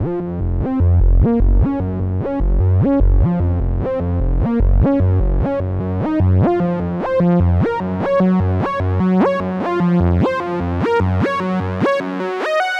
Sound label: synth loop 150 tech F